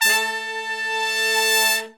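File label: BRS longs, R&R horns section; Roland L-CDX-03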